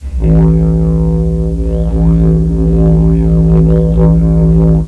didgeridoo, The didgeridoo is an ancient Australian wind instrument.; snippet of my own playing; recorded this in my office on a cheap microphone